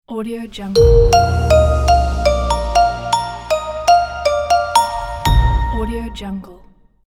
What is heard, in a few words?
raindrops logo